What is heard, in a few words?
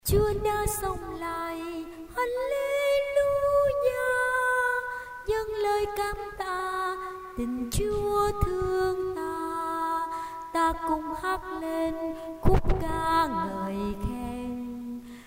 soprano tenor